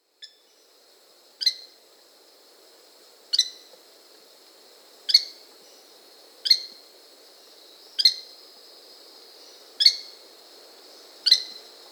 White-eyed Tody-Tyrant (Hemitriccus zosterops), Peru; S. America